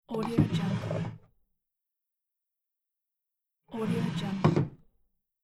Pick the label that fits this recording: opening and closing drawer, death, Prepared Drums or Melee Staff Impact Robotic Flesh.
opening and closing drawer